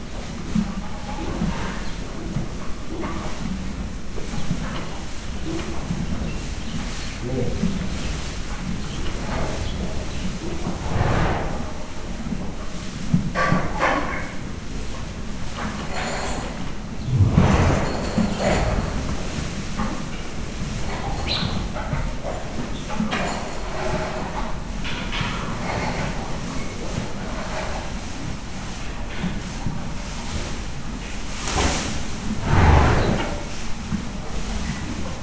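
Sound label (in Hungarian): szalmában matatás háttérzajok, ló; Hortobágyi Nemzeti Park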